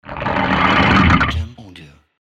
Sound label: Alien Growl